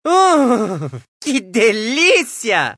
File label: correct response; codeapillar